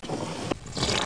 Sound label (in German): Glas